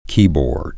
keyboard

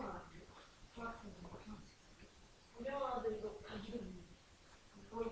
cow

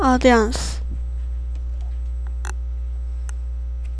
audience

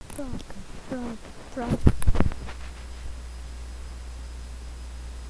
frog

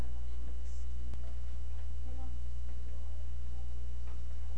cough

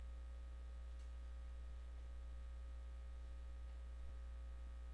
hiss

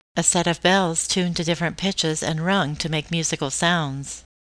chime

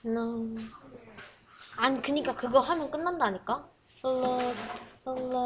flute

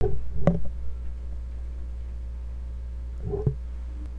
explode

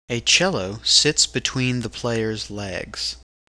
cello

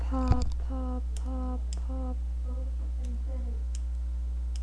pop

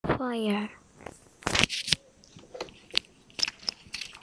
fire

